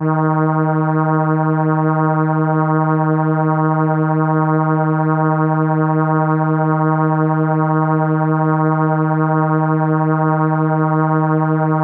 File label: Horn D#4, ARP Solina